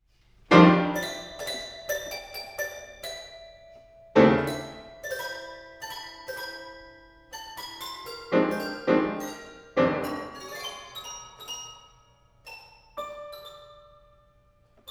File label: aria for toy piano